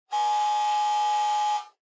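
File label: Doorbell Mechanical Buzzer Far 60Hz; ドアベル 機械式 ブザー; 遠い 60Hz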